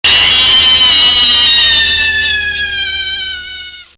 scream but faster; golden freddy

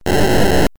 fireball; sonic the hedgehog

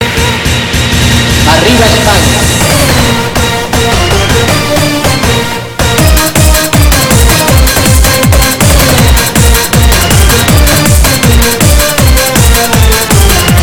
cara al sol eurobeat

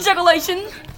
scream of boy

